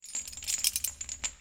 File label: Chain Move Fast; Puddle Plus